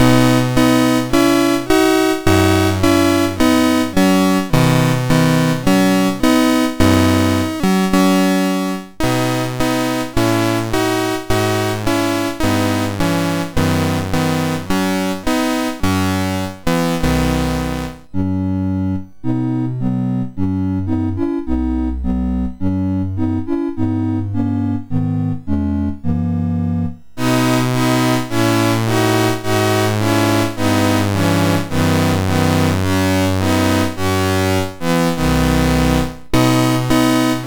Digi Hits, MOS6581R3

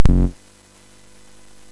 SFX, MOS6581; Narco Police; MOS6581R4; hvsc

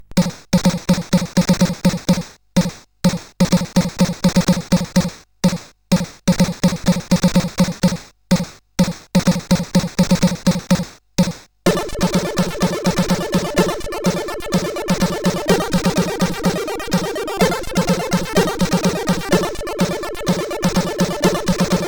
Echo Drum, sid CSG8580R5

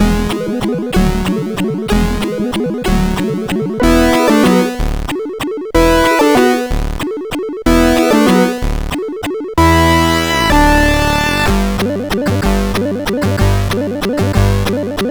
Game tune, sid CSG8580R5

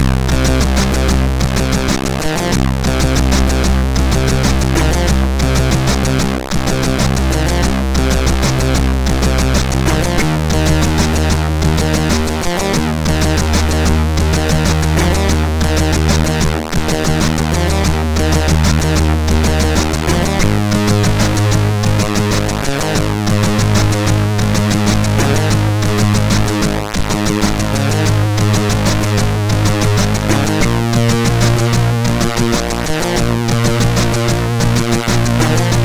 Basmus, MOS6581R3

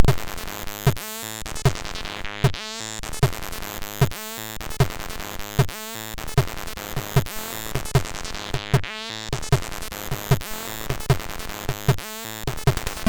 Stab Intro Tune, sid

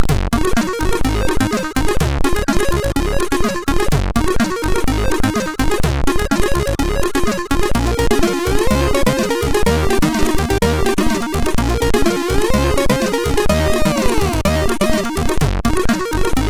Strange, sid, MOS6581R3

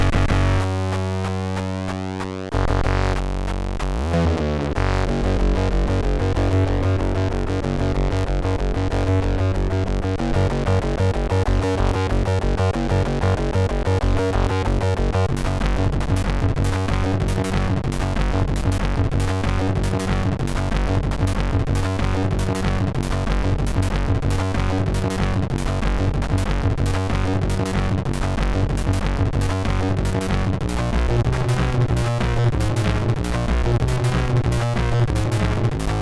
Heavy Phasor, sid MOS6581

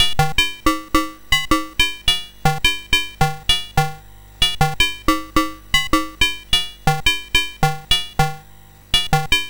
Pots and Pans, MOS6581R4

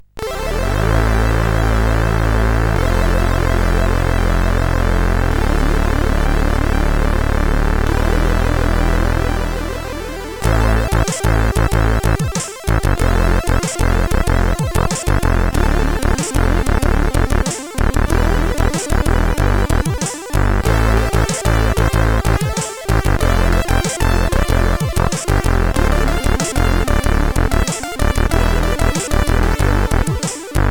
Brainball, sid CSG8580R5

